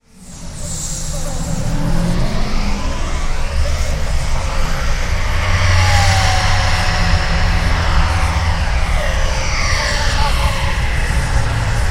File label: Mil City Ambience; Metal Arms: Glitch in the System